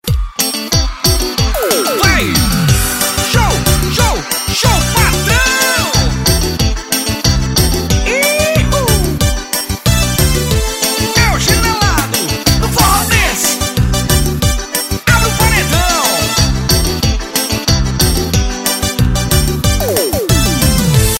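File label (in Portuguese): forró xinelado ao som desta guitarra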